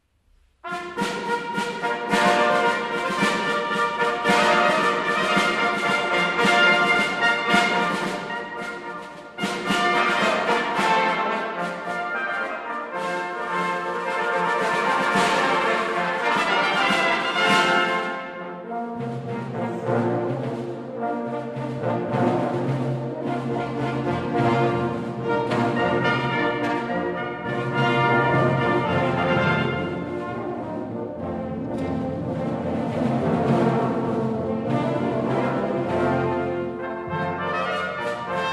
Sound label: Copenhagen Brass